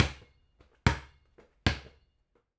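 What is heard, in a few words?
basketball dribble ball bounce; sporting goods; nova sound hoops; sporting goods master collection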